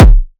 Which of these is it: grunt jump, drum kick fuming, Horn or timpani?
drum kick fuming